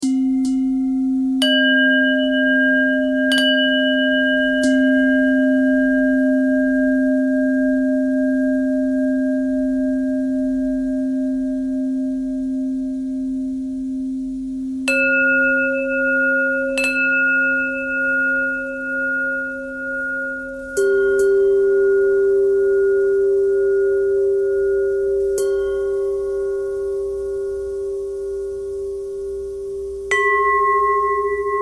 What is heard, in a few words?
bell bell thread simple, bells